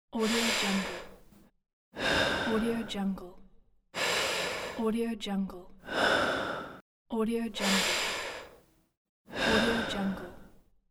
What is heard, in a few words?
calm steady breathing